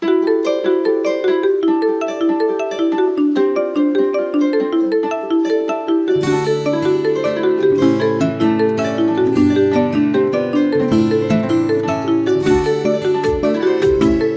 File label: Xiaomi Classic Ringtone